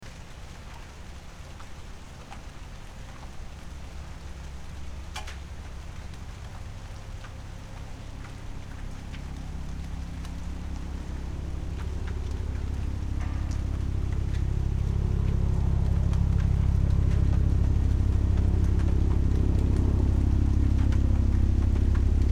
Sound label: rain helicopter